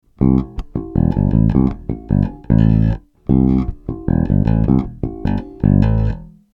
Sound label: bypass, Zoom MS-60B; MusicMax